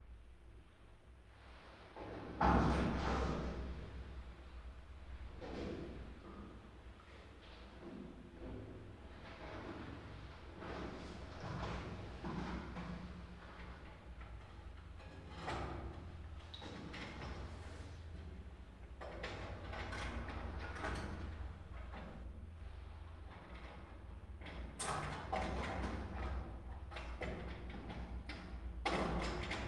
ambient bunker big space inside small room moving noises stereo; fvtt sonniss gdc audio